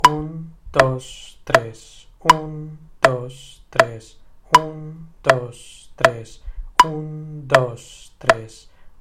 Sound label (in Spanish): 3x4 compas